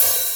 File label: Studio Open Hi Hat Sound G Key, Royality free open hat sound tuned to the G note.; open hat samples